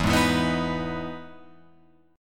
E Major 7th E down Guitar Open F; EM7 strummed; JGuitar

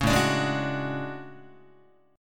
A Major 13th Guitar; AM13; strummed; JGuitar

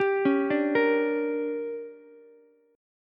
D Major 7th Suspended 4th Sharp 5th, Ukulele; DM7sus4#5; arpeggiated; JGuitar

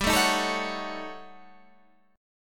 D sharp 7th Sharp 9th Flat 5th

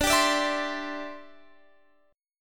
E Minor 7th Sharp 5th D

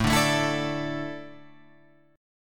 A Suspended 4th down Guitar Open F; Asus4 strummed; JGuitar

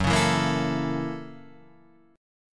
Gb 7th Sharp 9th Gb down Guitar Half Step Down

